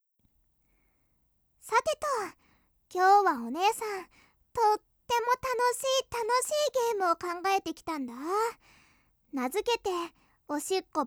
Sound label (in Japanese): おしっこ ペロペロ ゲーム